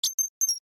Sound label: UI Commit, User interface feedback sounds